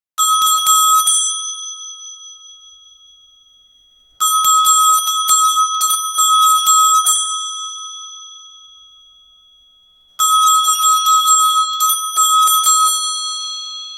nokia arabic ringtone

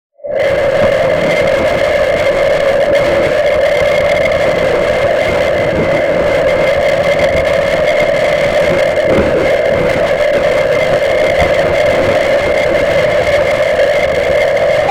space sound of black hole, cosmic phenomena

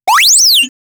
retro arcade

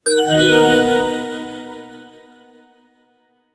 anime japanese game start

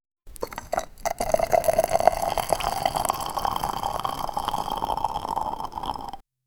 sound of drinking a potio

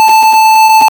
fully charged electronic, 16 bit

